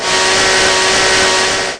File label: wrench big miss; svencoop